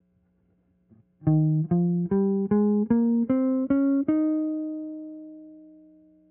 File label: scale Eb7